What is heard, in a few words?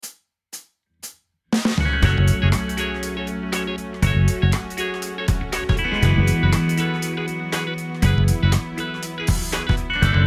Riff Backing